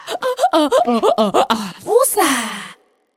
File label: breathe woosah